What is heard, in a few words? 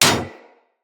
Bullet Impact Generic Enemy Shield; Weapons; Surface